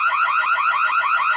bank alarm, sirens

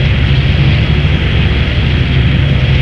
engine, aircraft engines

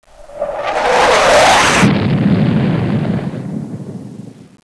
jet crash

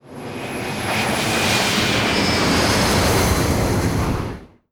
fighter flyby, aircraft

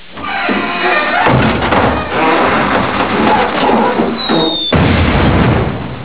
fall apart

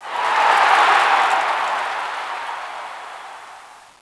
crowd cheers

